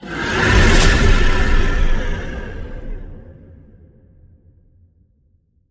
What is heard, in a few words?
Troll Yell Whoosh Hit